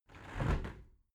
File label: window close tilt